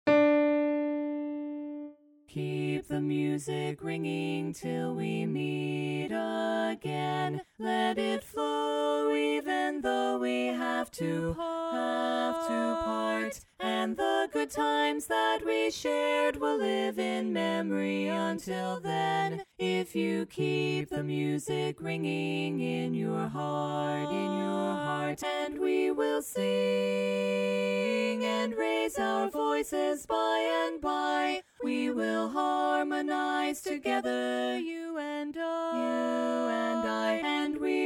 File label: Bari Missing